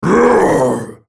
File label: spellcast effort; Volibear